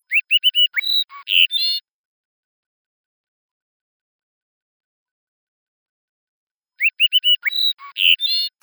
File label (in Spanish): canto, especies amenazadas; conservacion de especies amenazadas; biodiversidad